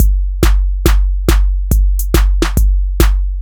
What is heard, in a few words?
Groove Pizza 70bpm